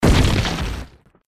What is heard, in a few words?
explosion implosive; tntbomb